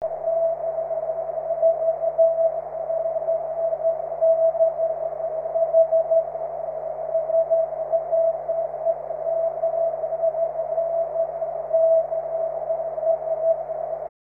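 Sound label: continuous wave, 23cm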